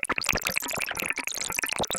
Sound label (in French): Score Jauge Formule